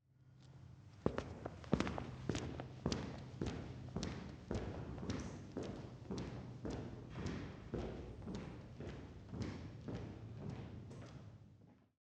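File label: steps in saal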